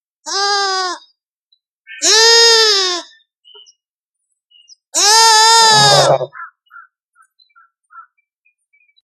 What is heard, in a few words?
Lamb Bleats; ORION